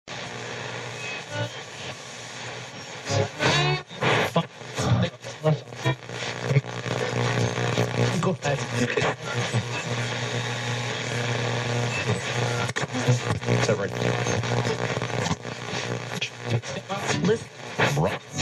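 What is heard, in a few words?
sound of searching for wave and interference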